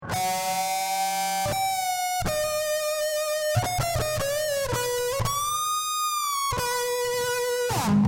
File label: distor